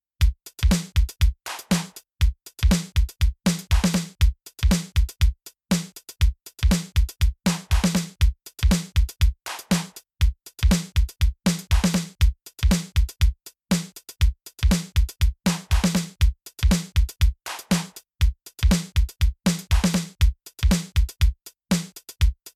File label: 80S DRY